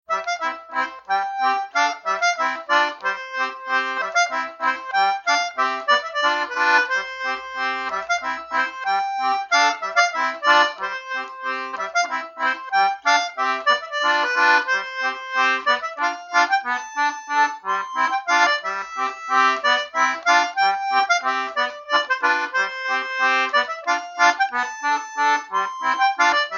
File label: concertina; Chinese